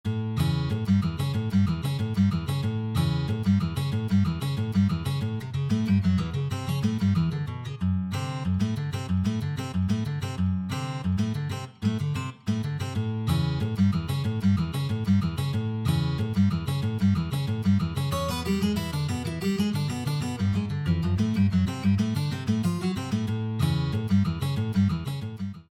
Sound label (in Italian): chitarra; Piperno; corso completo di chitarra acustica